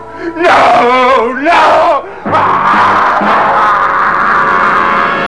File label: barn scream